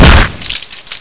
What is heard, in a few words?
headshot